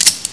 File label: reload, uzi